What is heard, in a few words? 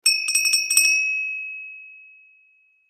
hanging Bell